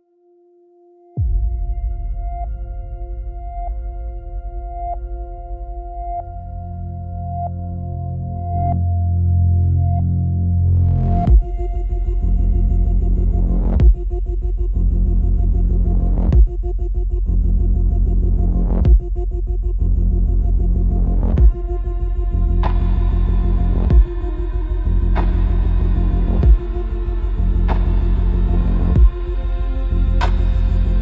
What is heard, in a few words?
mysterious cinematic glitchy sfx mid tempo; for travel vlogs